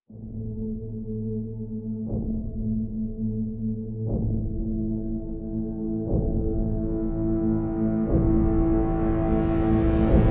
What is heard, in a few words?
Pads and Pulses